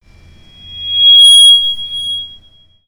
feedback; Left 4 Dead 2

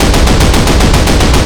Flakvierling Shoot; Minecraft; Infamy; Last Alive Pack

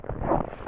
after snow; Sid Meier's Civilization 4 Complete; Beyond the Sword; Units

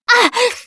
hurt minor, russian, Survivor Voice Producer

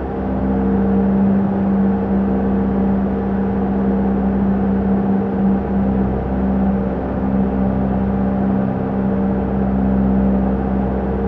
distance airplane idle; Hearts of Iron IV Death or Dishonor

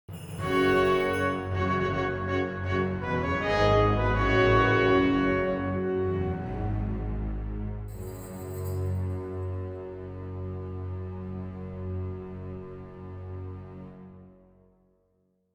killed by swadian, music; Mount&Blade Warband

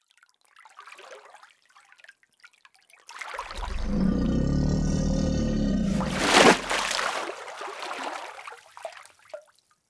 gator; ambient, random animals; Left 4 Dead 2

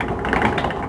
Wood Gears; Sid Meier's Civilization 4 Complete; Warlords; Units

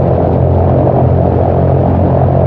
Lancaster Prop; Minecraft; Infamy; Last Alive Pack

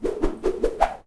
Pistol Swirl; Sid Meier's Civilization 4 Complete; Warlords; Units

